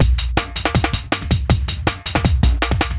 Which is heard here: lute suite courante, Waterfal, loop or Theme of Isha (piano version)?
loop